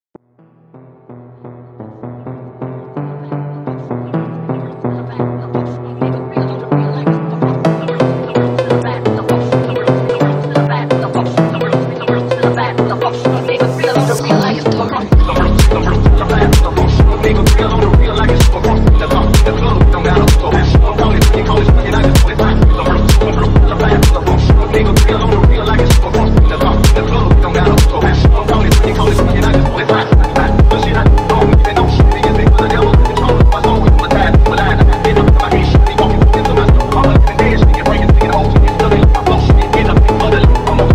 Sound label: PHONK